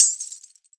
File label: MIXED PERC; 90 s Sample CDs